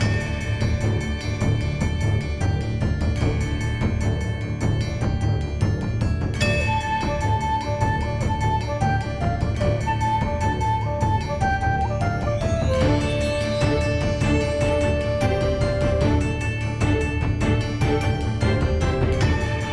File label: Gameplay Loop; Almightree The Last Dreamer